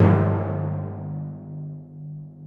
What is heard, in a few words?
Tmp1 Hit1 Low S1, Drum Machines, E-MU Proteus-2000